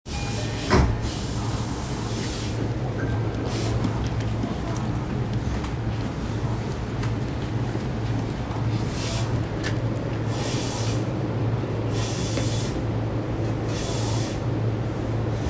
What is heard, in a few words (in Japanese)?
JR中央線快速豊田東, train sounds